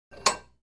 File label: Free Weights Exerci